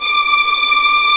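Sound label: D6 tremolo, strings; East West; The Ultimate String Collection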